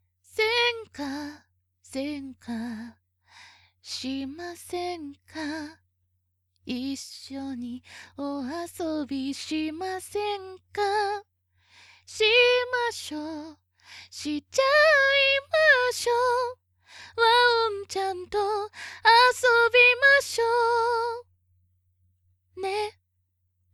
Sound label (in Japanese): バラード風; しませんか♪